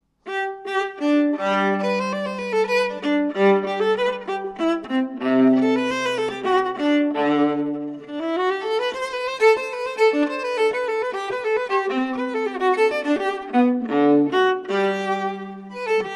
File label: viola G.B. Guadagnini model; viola Anelli